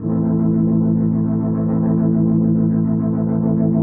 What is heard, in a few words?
DEEPHOUSE ORGAN 125 A